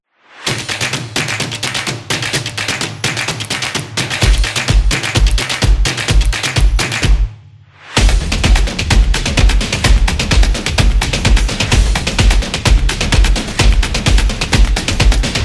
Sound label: Drum Samba